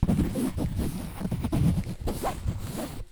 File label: Course Fabric; BB Retail Therapy Sample Pack